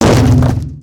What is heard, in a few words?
Spawn Land; Dragon In A Box; destructibles